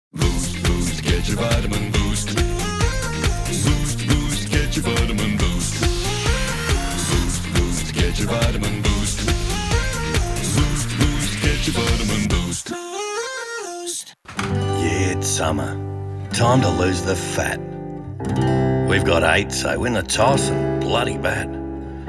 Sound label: Singing VO